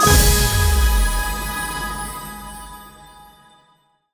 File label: super mega win stop; turn your fortune